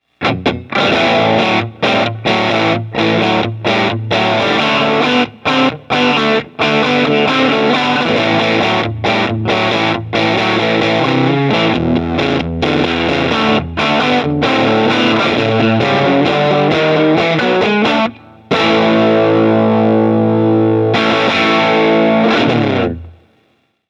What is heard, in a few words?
SMALL BLUES